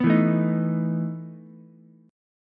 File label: E Major 7th Suspended 4th, Ukulele; strummed; JGuitar